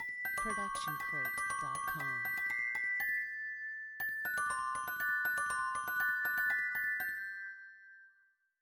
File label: christmas music box; soundscrate